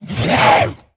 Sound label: Zombie Monster Attack; Pain Train